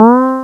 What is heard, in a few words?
lead; Mono